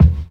tight steel kick drum sound D# key, Royality free kick drum tuned to the D# note